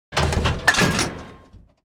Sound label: open; 添加开箱音效; superbwarfare